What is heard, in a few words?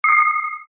harmonic event